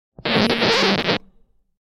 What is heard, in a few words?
record needle scratching vinyl